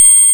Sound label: Hard PCM Chip; chiptune